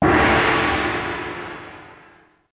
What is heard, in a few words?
Scoreboard wins you; LittleBigPlanet 2; PS3